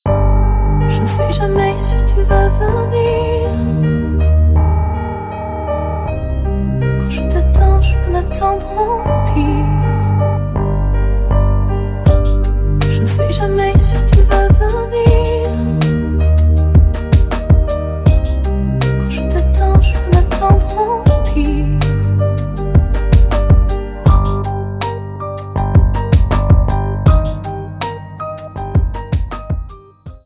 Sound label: Minus, mono; 30sek